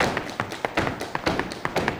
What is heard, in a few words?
120 clap